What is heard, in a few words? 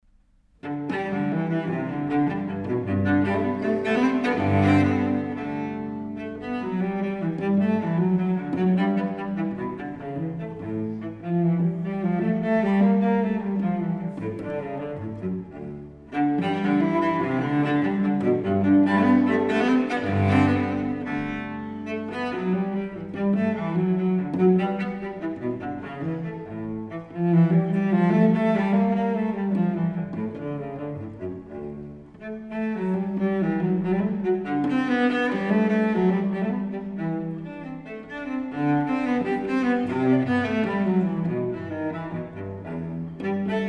Cello Suites, G Major, Gigue; BWV 1007